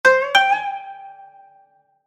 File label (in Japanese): 場面切り替え 琴 和風